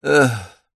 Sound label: male middle aged exhale sigh moan